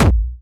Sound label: Soft, kicks